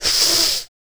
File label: Vocal, R&B & Dance, FEM. HUMAN